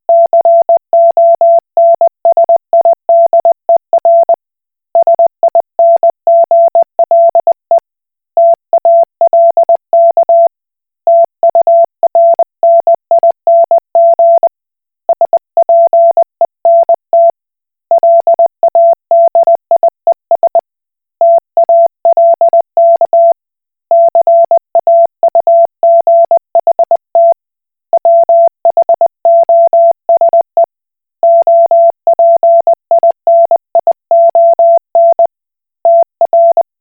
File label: Koch Morse